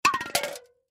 Beer Can Drop, Beer Can Drop Knock Over Full Closed; Drops; Objects; Blastwave; Foley